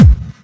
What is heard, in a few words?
kick